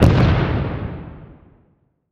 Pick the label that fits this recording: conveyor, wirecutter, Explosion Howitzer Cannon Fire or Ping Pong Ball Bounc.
Explosion Howitzer Cannon Fire